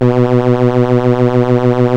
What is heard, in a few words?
Stylophon B, Keyboards of The 60's and 70's; Stylophone